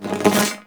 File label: Projectile Whizz By; Enemies; Abilities; Warframe; Sentient; Brachiolyst